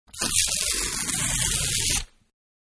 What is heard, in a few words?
Paper Torn Long; Paper-Being Torn-Long; Materials; Manhattan Production Music